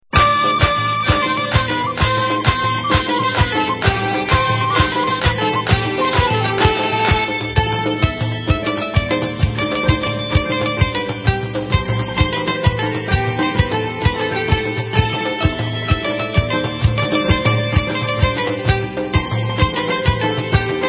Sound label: Instrumental